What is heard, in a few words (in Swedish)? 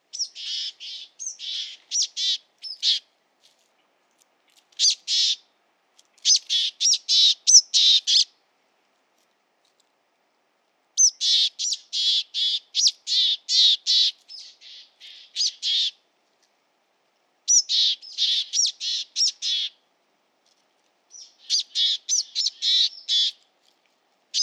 lappmes Poecile cinctus locklate